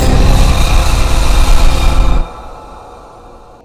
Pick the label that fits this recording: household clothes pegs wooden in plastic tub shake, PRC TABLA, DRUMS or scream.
scream